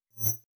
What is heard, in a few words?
scifi button beep; hollywoodedge